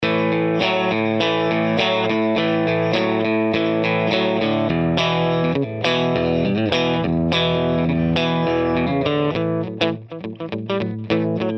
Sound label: Clean